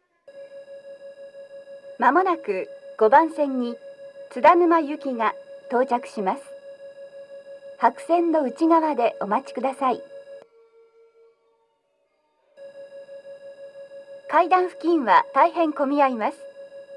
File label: nishi funabashi tsudanuma rush, station